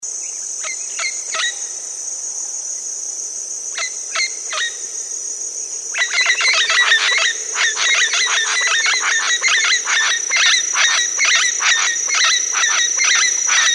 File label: red tailed parrot